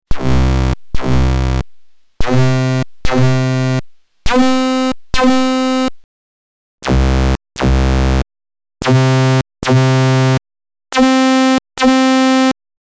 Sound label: Minibrute V vs Microbrute